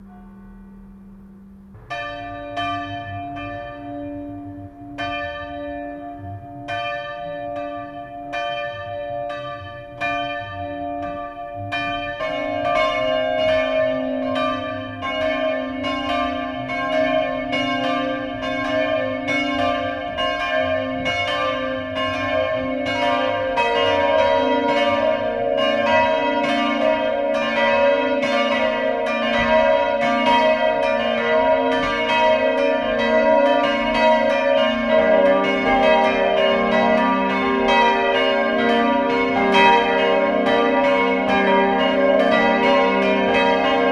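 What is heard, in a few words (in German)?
Geläut der Kirche Glocken zusammen; Martin-Luther-Kirche in Voehringen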